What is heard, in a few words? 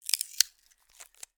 sticky tape rip roll gentle; office; zapsplat